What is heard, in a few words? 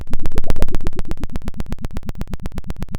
Sound of a thunderstorm, center